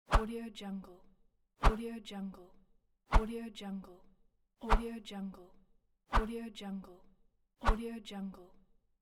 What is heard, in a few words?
swishes